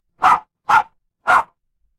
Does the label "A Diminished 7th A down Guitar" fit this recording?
No